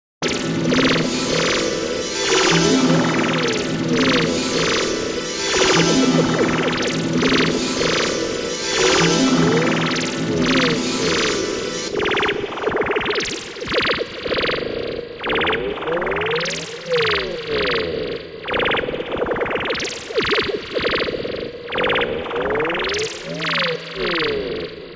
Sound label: Effects